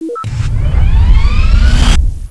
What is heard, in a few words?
baddie powerup; Gunlok